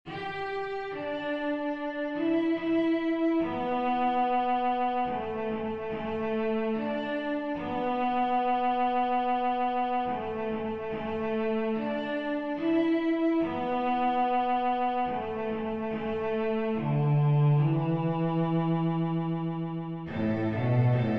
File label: Bass; The Barrows